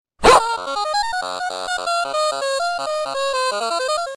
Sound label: Sound FUNNY